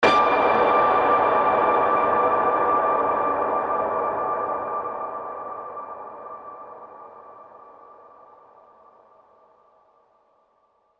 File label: Dystopian Ping